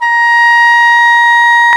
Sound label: Cor anglais; CMI